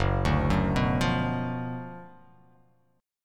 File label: F sharp Major 7th Suspended 4th Sharp 5th F sharp; chordshape; down